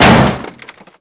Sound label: ammobox; Call of Duty Modern Warfare; physics